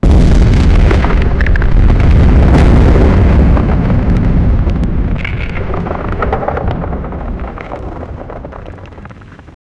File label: massive tectonic rumble, disaster